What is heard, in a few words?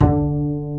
PIZZ BASSE; E-MU Formula 4000 Series Vol. 5; Protozoa; Proteus2 Instrmt